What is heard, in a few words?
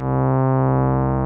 SOFT BASS; Masterbits; Dance Trax